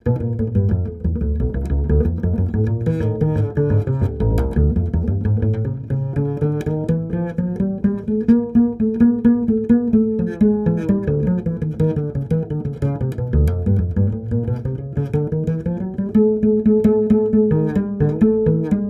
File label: bass play music; The Sims 2